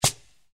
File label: reelspin click; Africa Run